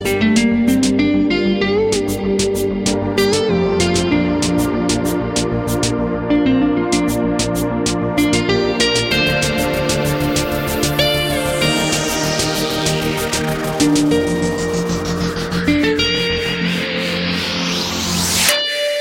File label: soft ringtone